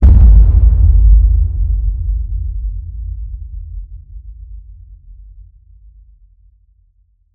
HIT Boom, Cinematic Hits Designed

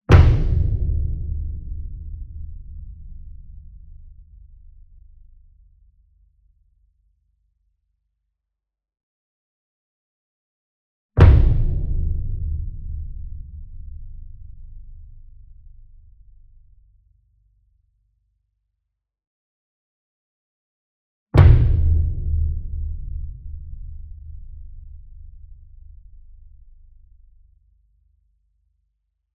Bass Drum Concert 40 Inch Flam Soft Beater Near; SINGLE DRUM; boom library; Cinematic Strikes Construction Kit

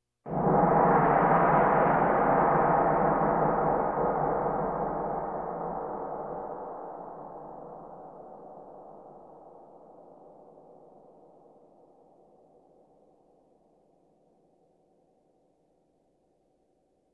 Percussion Bongos Tympani rolls Gongs Drums

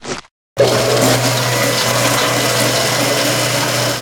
Cartoon Teddy Bear Blender; SFX Kit; Foley - Misc

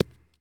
Baseball Bounce Grass

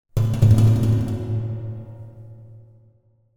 Metal Drum - Several Hits; Hollywood Edge; sound designer's toolkit v2

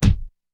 Thud; blindside

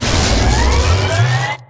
Machine Generator Start; Machinery - Generators

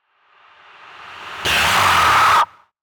Alien Screech Scream - Monster Dinosaur; Hollywood Edge; sound designer's toolkit v2

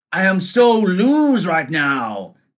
LOSE; Pitch Black Audio Pong

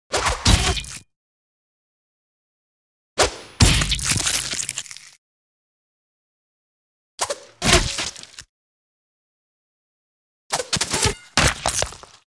Beheading With Sword; 4 Versions; Sound Ideas; Series 2000

